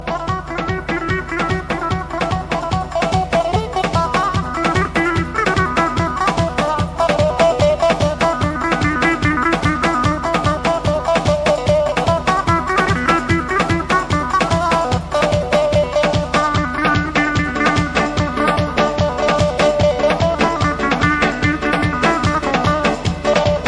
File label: Kurdi